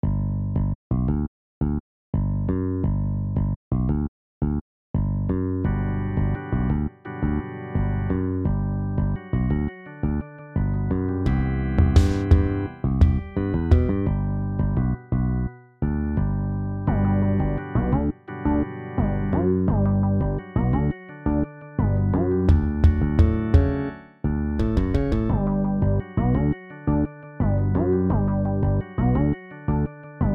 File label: blues